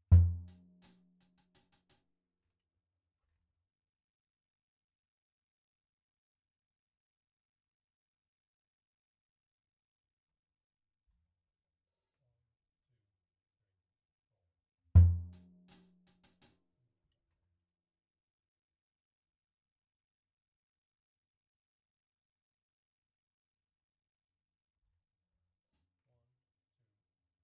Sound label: kick